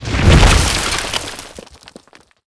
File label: Die; AncientStony